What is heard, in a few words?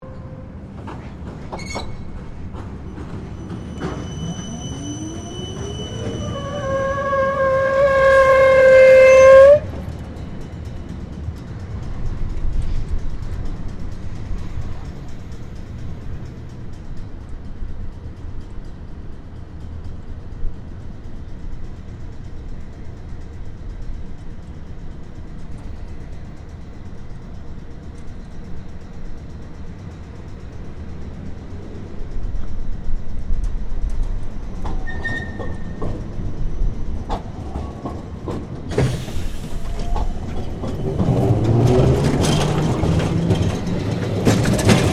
Tram Melbourne W Class Crossing Points Stopping